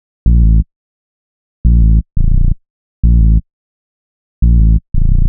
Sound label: 173 Bass, Drum n Bass; KJ Sawka; Drum n Bass & Beyond